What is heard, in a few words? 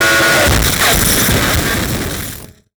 Title Transition Communication Distortion Radio; Adobe; Production Element